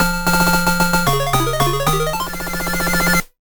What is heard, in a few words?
Chaos Emerald (8-Bit Soundtrack); Sonic the Hedgehog 2 Anniversary Edition; Genesis